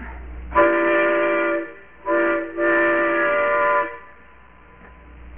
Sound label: whistle